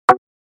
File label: E Rim Drum single